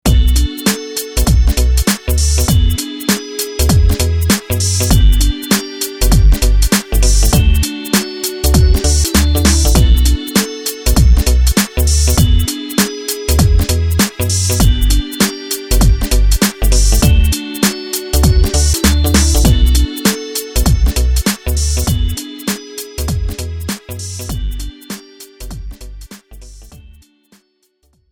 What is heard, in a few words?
RnB